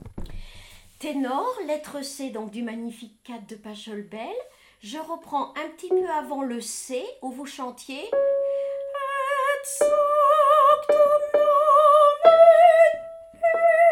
Magnificat Tenor; Pachelbel

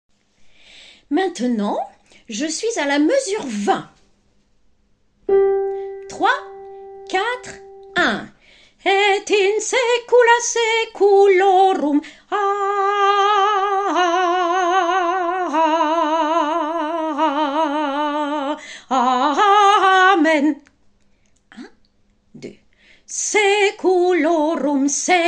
Allegro Alto

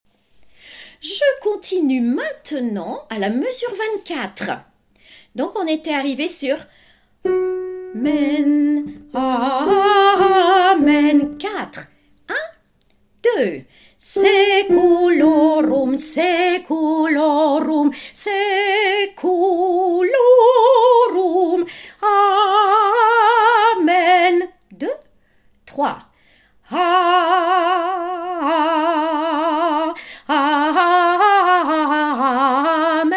Allegro Alto

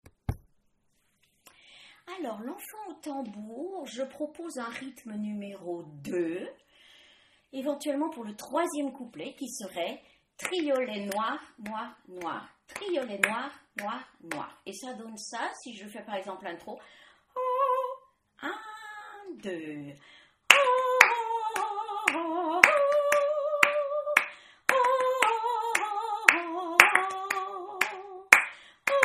Rythme

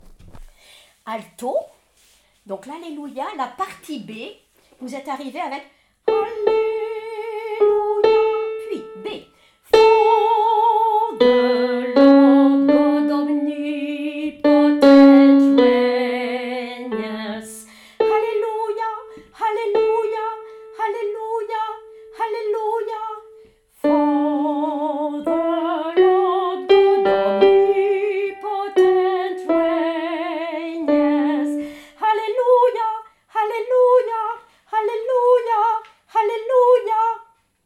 Hallelujah Handel Alto

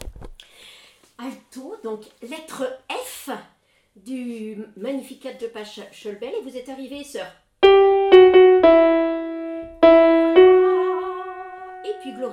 Magnificat Alto; Pachelbel

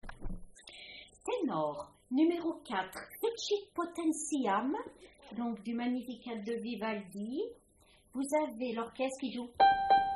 Magnificat Tenor; Vivaldi

